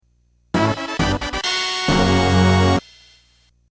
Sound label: Notification Fanfare; Nintendo; Kirby Super Star Ultra (DS)